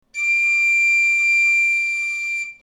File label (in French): fa, galoubet; Note Fa - 5ème ligne